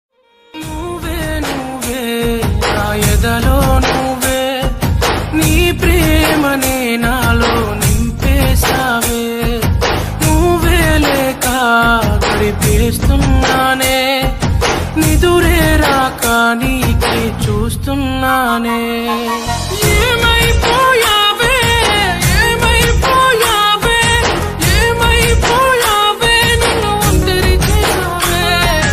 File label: song love sad breakup